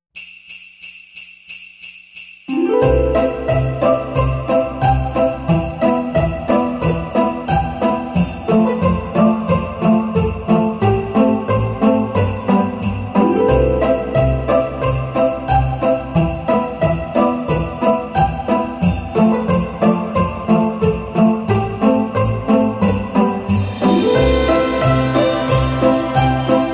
Happy Christmas Royalty Free Background Music For Videos